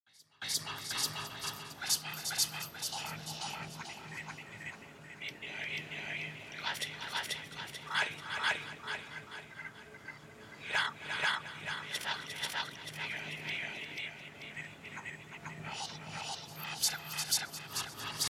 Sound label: whisper